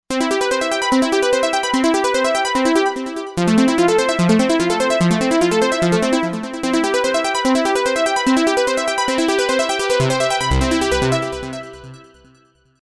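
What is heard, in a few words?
Sequence Analog SQ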